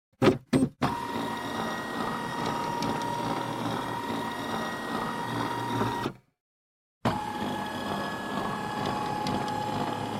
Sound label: Epson ET printer scanning a document